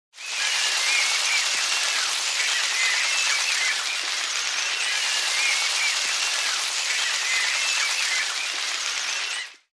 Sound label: applause